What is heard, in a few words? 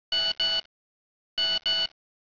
sms